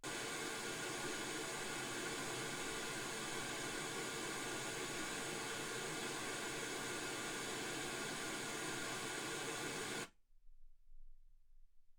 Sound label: wgn 48kHz